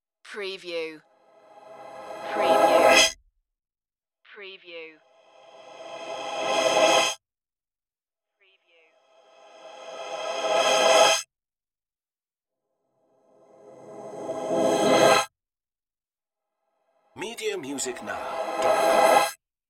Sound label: magic rising swishes